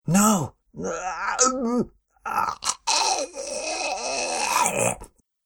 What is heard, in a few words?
Man Transformed Into Beast, monster sound effect; A Fantastic Monsterous Sound Effect